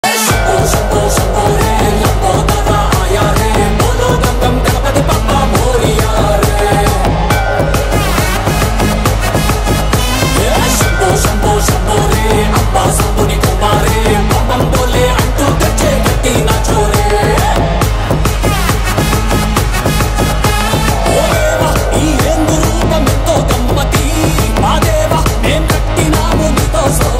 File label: Anthem telugu ringtone